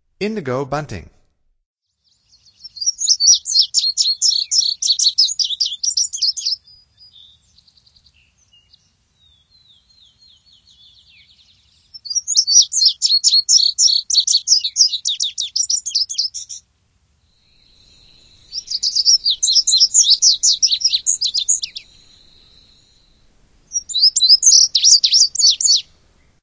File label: Indigo Bunting; Nature Songs; N. America; Songs of Birds of North America; Stokes